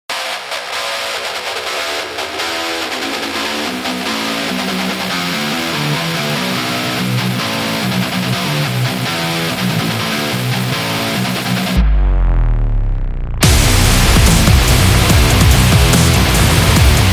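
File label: Game Battle, Sport percussion background music created for action, competition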